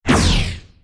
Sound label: gatling shot